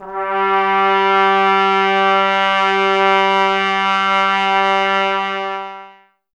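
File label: brass trumpets G; 90 s Sample CDs; Miroslav Vitous; Woodwind Brass Ensemble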